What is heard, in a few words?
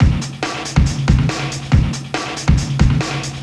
DRUMS